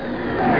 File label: GATE STRT; Monster Media Number 15